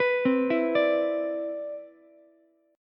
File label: C Major 9th, Ukulele, C6; arpeggiated; CM9; 16,12,12,17